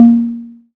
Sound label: SERGE Tom High, drums; Polyend; Stazma & Concrete Collage; Concrete Collage Drum Kit; Concrete-Serge Drums